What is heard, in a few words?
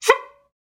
Bycicle Horn; SoundsCrate